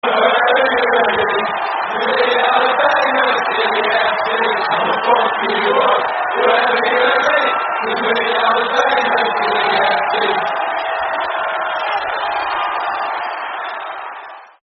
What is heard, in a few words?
No Cheer, terrace chants; Everton